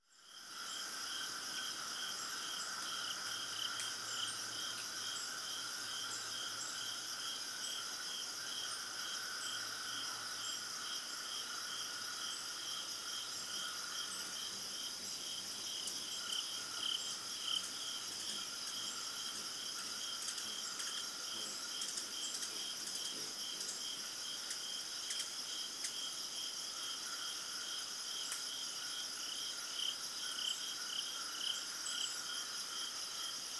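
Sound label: zvuki lesa, forest sounds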